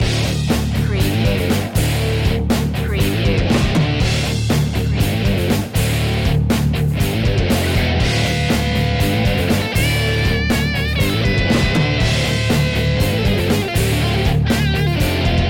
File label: metal loop